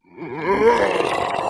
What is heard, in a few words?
zombie voice idle